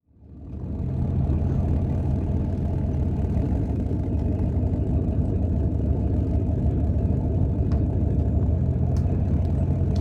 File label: Vehicle Boat Idle; transportation